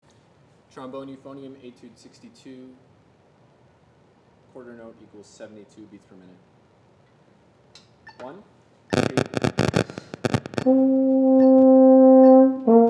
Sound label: trombone euph etude